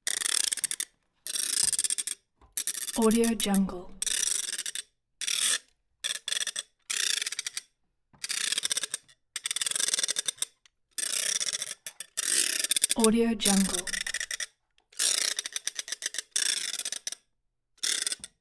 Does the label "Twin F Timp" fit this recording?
No